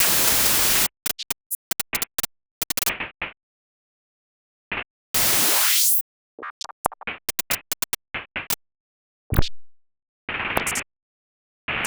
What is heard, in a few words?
noise filtering